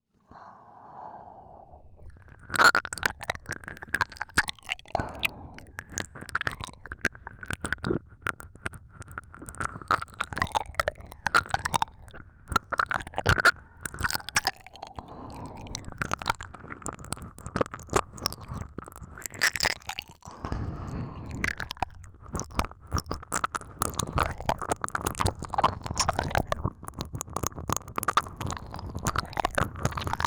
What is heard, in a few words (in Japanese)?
耳舐め, ASMR; SEなし